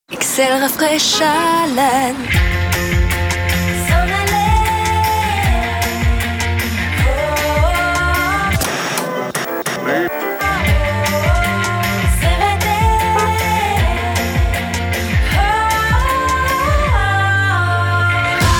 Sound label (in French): Pub, Voix chantee; Excel